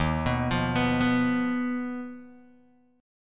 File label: D sharp Suspended 2nd Sharp 5th D sharp Guitar DADGAD